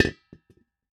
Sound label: Foley Tank Propane Tank Set Down On Cement Floor